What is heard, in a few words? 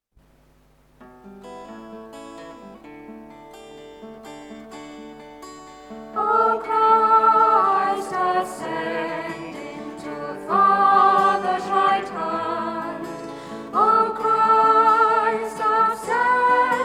A short verse anthem. Unison voices with guitar.; O Christ, ascending (Trinity)